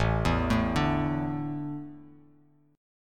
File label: A Suspended 4th; Asus4 arpeggiated; JGuitar